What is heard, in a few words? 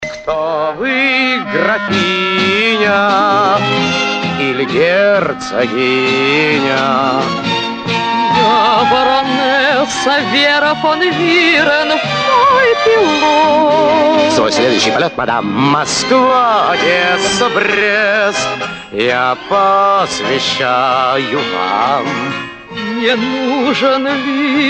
tango